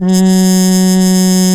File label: F#3, BRASS